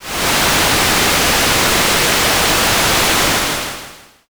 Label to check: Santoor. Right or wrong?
Wrong